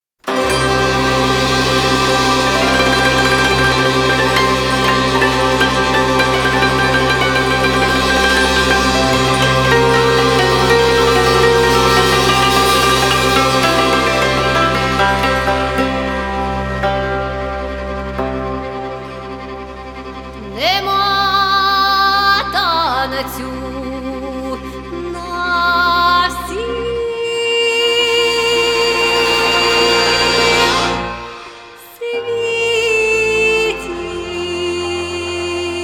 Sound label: Ukrainian folk group Коломийка